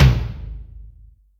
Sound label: Room, Acoustic Tom